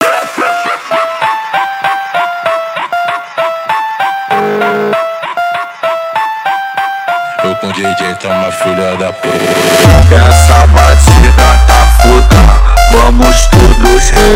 Hardstyle Remix Slowed

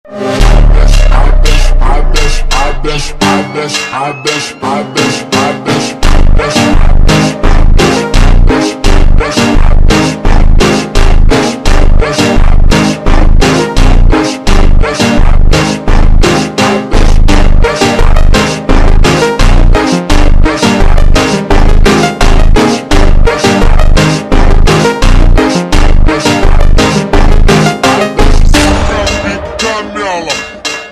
Funk; tiktok Version

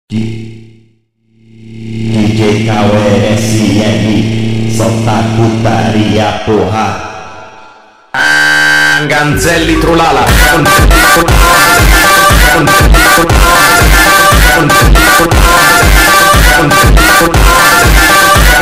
FUNK slowed reverb

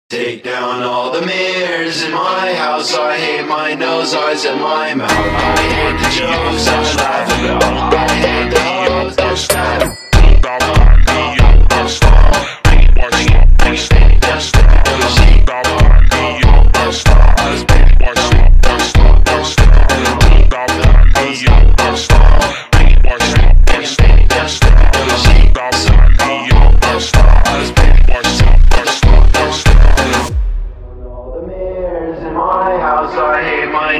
funk Ultra Slowed

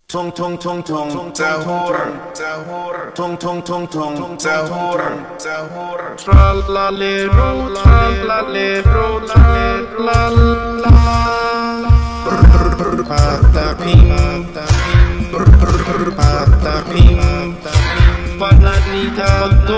reverb slow; SQUID GAME x ITALIAN BRAINROT

